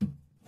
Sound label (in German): briefkasten oeffnen; minecraft